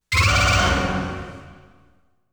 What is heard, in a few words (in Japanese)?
FANFARE III(階級昇降なしBGM); konami amusement sounds 93 summer